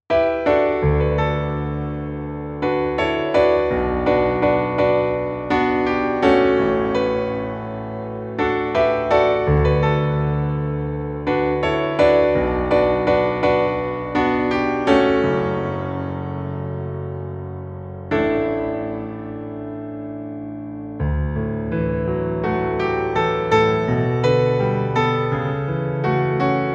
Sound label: Piano Cover